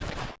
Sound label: jump